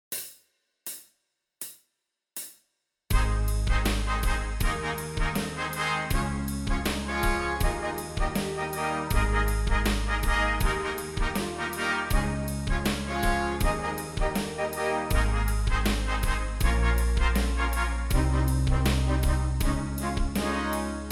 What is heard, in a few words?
Concert Ab Scale with Thirds